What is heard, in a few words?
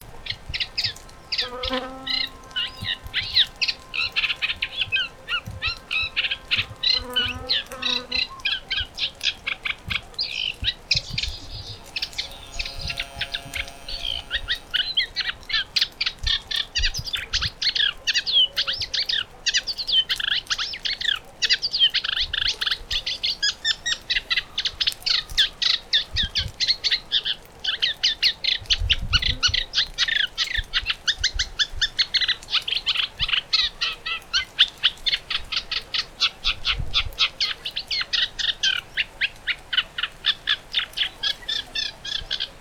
Sedge Warbler Acrocephalus schoenobaenus